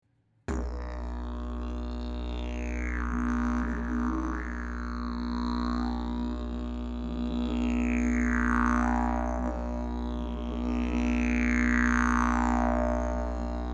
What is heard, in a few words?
didge right